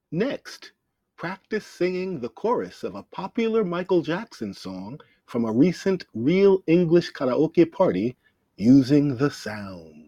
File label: karaoke next